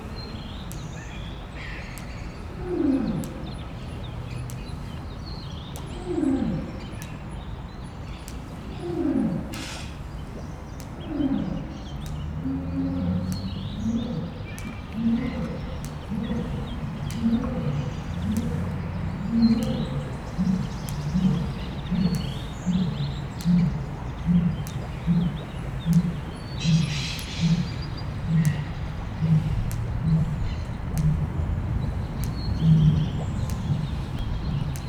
messziről, oroszlán; debreceni zoo 2012

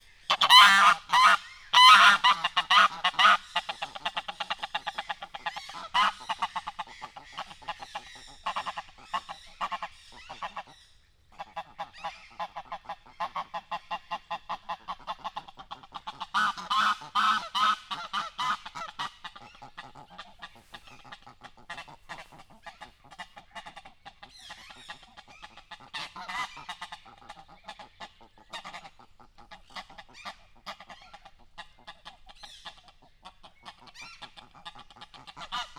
háttérbe húzódva, nyári lúd; miskolci zoo 2018

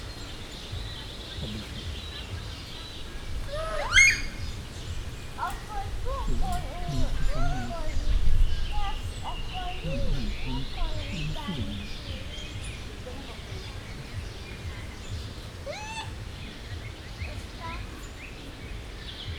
néhányszor sikítanak, japán makákó; jaszberenyi zoo 2015

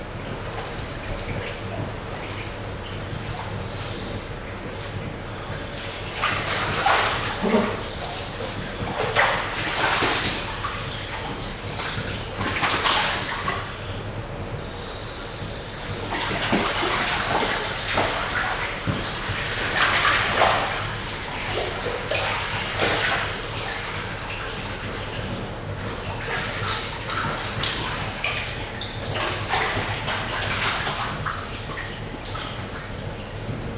kispingvinek rövid hangocska zárttér, pápaszemes pingvin; jaszberenyi zoo 2015

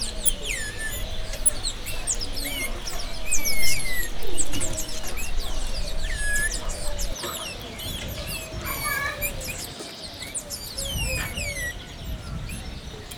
vékony hangocska, szél, lisztmajmocska vagy gyapjasfejű tamarin; jaszberenyi zoo 2015

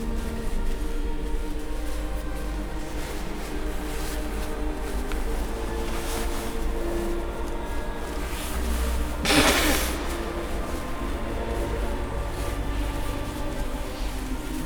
orrfujas templomban

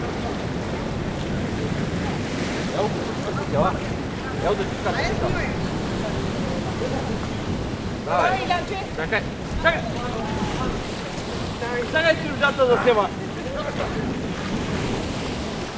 ketten is ugranak

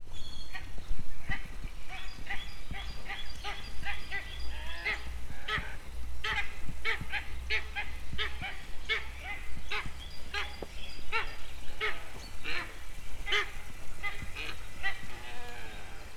madarak fel etartva aranyfácán, parlagi sas; debreceni zoo 2012

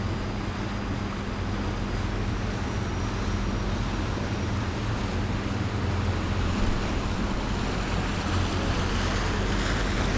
jobbrol egy tucat vizi jarmu, laguna; olaszorszag velence 2014

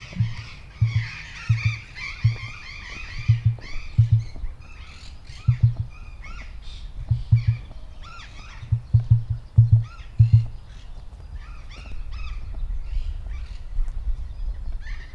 szünetekkel tarkítva, emu; marantz puska; debreceni zoo 2012

